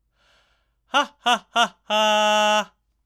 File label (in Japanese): 笑い声の延長で声を出す